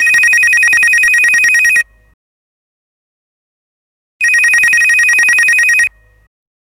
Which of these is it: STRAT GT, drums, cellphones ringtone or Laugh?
cellphones ringtone